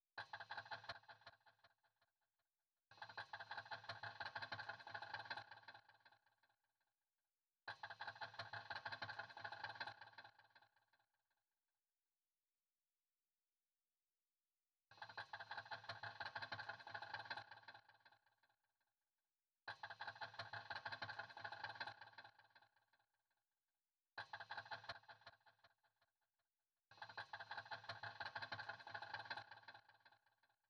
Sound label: glitch click